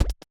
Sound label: reel Bounce